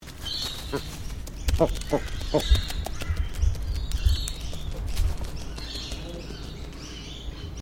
Bird Brush Turkey Running, Birds from Yandina Queensland; Animals